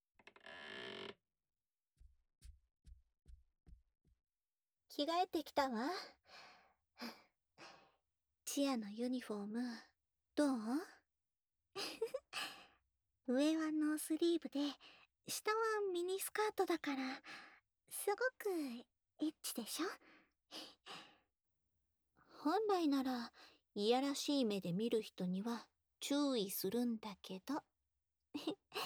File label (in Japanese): チアガールの二人の応援耳舐めと手コキ, ASMR